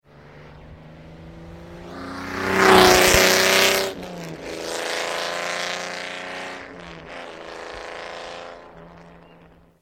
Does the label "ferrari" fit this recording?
Yes